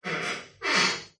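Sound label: household door wooden creak room perspective distance reverb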